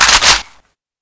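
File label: machine gun bolt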